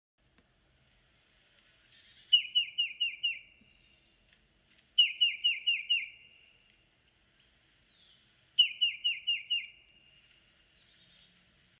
titmouse song

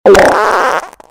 fart

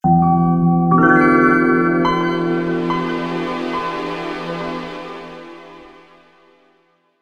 windows 95 startup sound clean

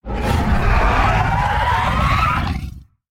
indoraptor

